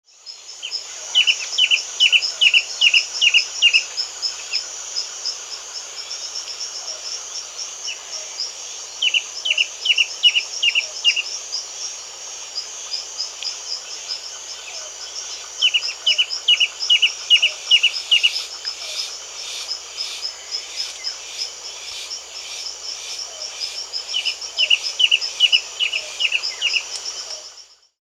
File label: Stripe-Chested Antwren (Myrmotherula Longicauda), Andean Birds; S. America